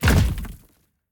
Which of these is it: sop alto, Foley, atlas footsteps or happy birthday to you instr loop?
atlas footsteps